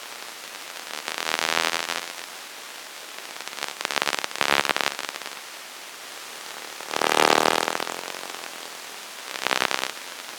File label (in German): zuendfunken, elektrosound